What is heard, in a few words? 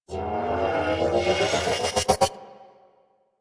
Cart Turret Energy Ball Charge Up; Warframe; Venus; Venus Turrets; Venus Cart Turret Energy Ball Agent